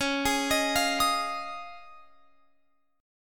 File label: C sharp Major Add 9th C sharp, Guitar Open F; arpeggiated; C#add9